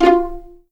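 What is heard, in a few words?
F4 pizzicato mezzo forte left, violins; East West; The Ultimate String Collection; VLNS PIZZ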